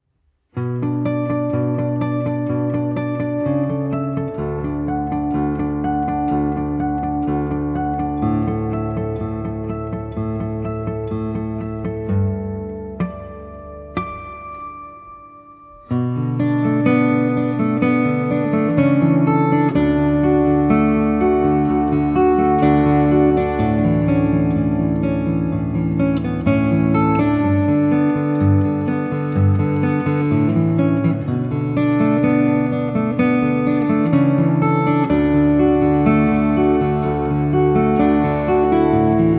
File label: fingerstyle guitar cover